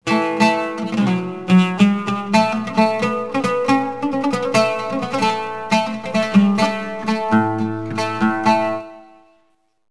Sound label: oud